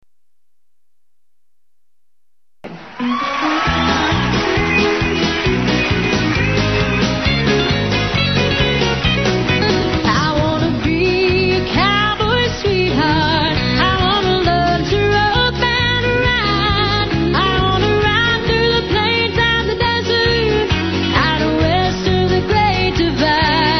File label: picnic polka